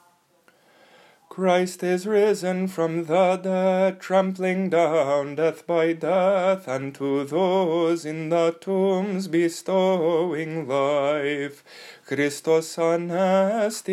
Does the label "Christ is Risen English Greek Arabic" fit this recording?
Yes